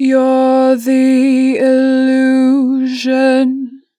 You're the illusion, 2 bar vib, Vocals, Natural Vibrato; Harmor Vocals